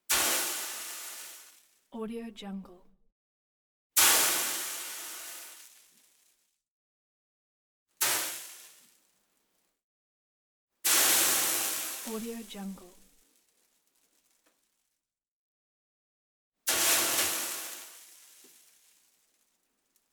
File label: steam drop